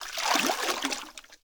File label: Water Pail Attack Underlay